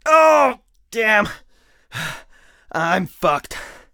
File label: hurt heavy; Escape from Tarkov